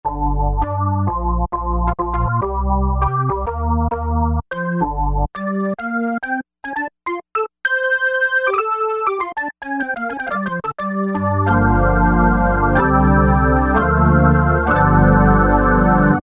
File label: org, E-mu Orbit V2; Factory presets